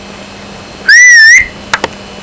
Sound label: whistle